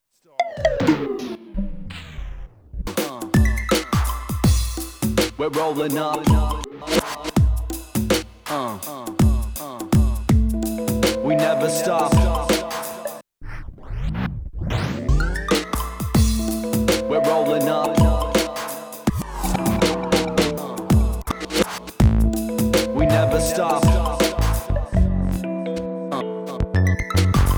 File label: Roland MC-101